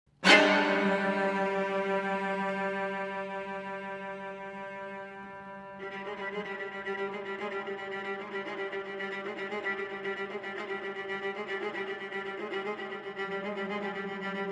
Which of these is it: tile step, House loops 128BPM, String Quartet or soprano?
String Quartet